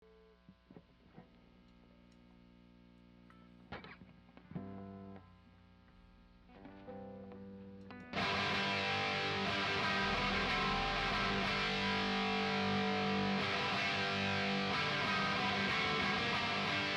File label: Drum Stems